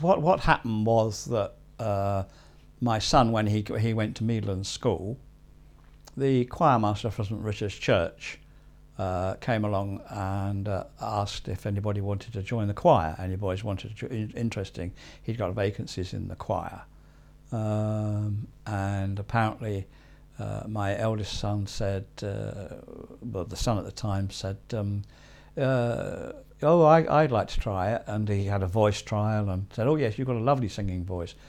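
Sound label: Church Choir, School Choir on TV; St Richard's Chruch Chior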